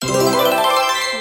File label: victory; slide block